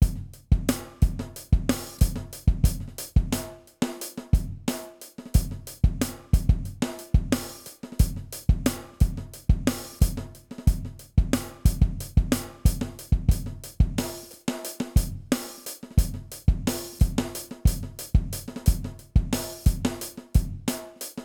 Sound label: drumloop 90